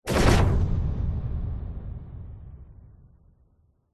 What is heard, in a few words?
science fiction cannon gun blast